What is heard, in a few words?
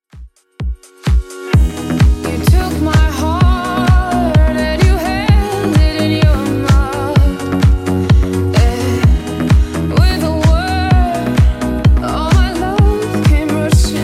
2ks Redrum 128 bpm